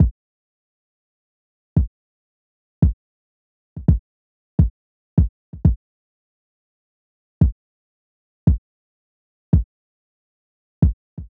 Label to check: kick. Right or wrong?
Right